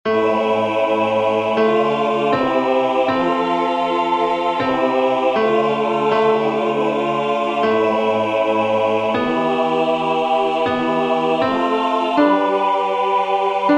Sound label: Tenor